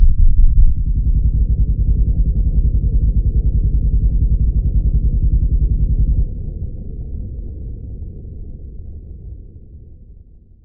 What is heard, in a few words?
deafness no ring; residual woosh when ringing is disabled; ACE3; Arma3; hearing